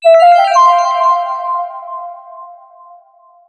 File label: Light, ringtones, Gigaset; GS290